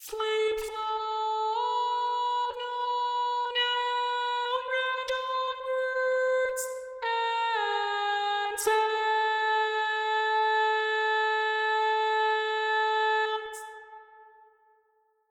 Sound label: Random Words and Sounds, Tenor, Barbershop